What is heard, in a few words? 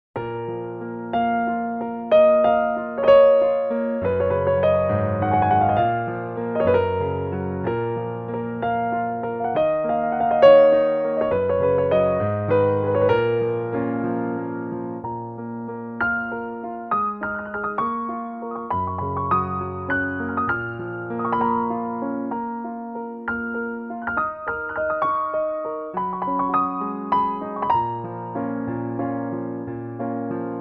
Piano